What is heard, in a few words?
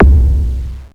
BD, Mutable Instruments Anushri